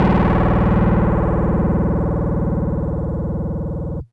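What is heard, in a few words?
Fuzz Clav DX100 D#0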